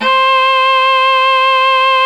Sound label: viola; Strings & orchestra; GMPlay